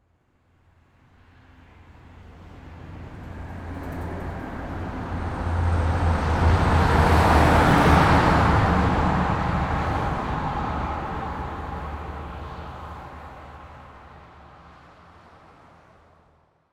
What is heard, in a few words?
Truck Driveby Under Bridge 180D; transportation